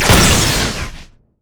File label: grenade; rumble skin03